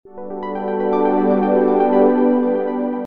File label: Ringtone (Pad); Crowdsourced Sample Pack; Pads